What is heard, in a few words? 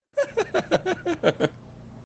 Laugh